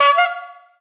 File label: Horn clown